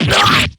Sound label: damage; bb-kurogane